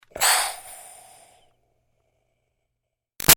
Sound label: Steam Release Iron Chuff Smooth; Miscellaneous Household; Big Room Sound